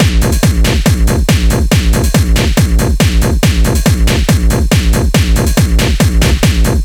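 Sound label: Beat, hard style; Ударные в стиле Hardstyle; Drum Loop; 140BPM; Drums; Барабаны; Биты; HS Beat A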